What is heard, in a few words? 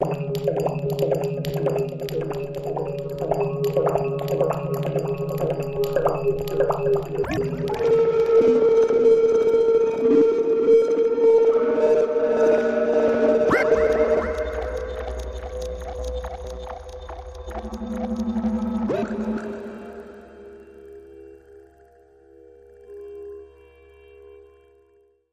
Pulse Syndrome Musical Pulsers